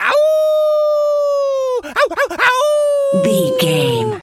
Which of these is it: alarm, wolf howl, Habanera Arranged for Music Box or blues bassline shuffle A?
wolf howl